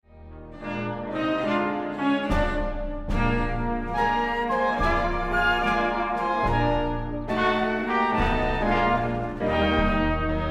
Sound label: Slow Drag Blues; Snowden